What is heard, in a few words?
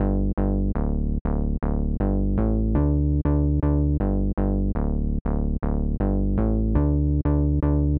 Bass, Happy People 120bpm D, Nu Disco; Zero-G